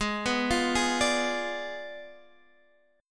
G sharp Diminished 7th G sharp, down, Guitar, Half Step Down